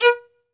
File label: Synclavier Strings, SOLO VI; ILIO